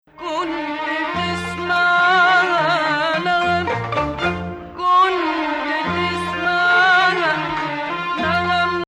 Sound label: Ajam Ajam-flat6; Ajam, which resembles the Western Major scale, has two distinct versions